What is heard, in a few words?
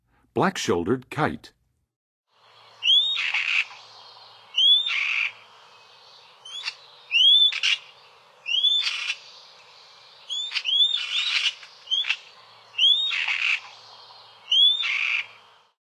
Black-shouldered Kite, Birds of North America; Western USA; Western USA Songbirds